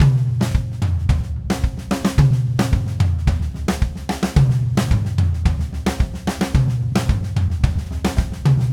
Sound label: Red Maple Groove 110, DW Red Maple Kit